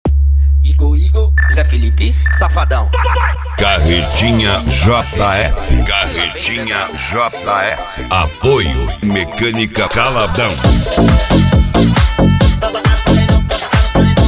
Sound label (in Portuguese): funk mega funk